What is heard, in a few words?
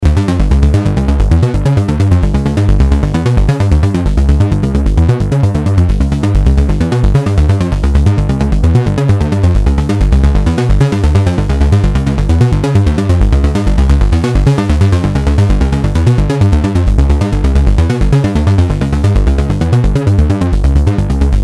moog, modular; modular planet